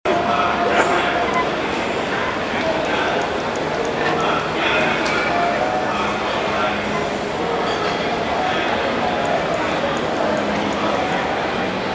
tai station ambience anouce at end, south east asia street recordings